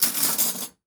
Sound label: Silverware Movement, Kitchen